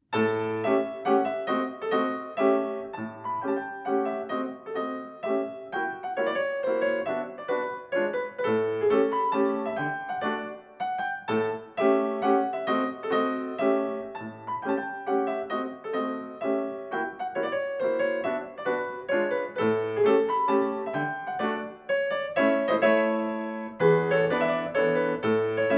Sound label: chopin mazurka posth d major